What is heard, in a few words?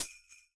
xmas hihat